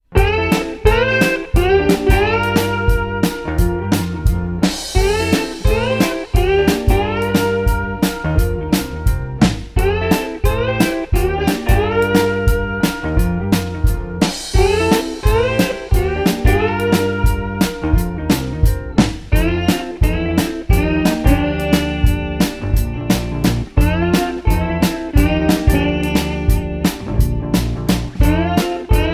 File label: Jazzy